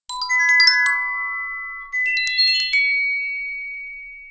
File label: Glocs WSOLA